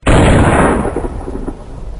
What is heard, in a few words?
explosion short